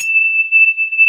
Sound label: TINGWAVE; Stringles